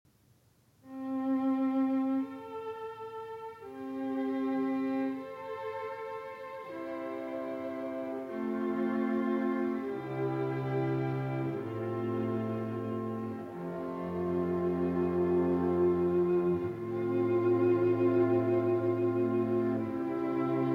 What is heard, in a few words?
String Quartet No. 15 in A Minor; Op. 132